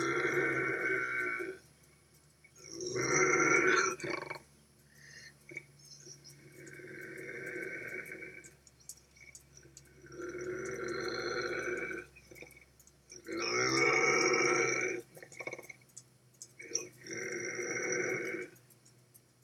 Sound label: Bobcat